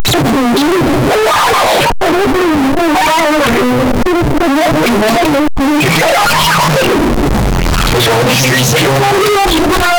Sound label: cards shuffle; dealing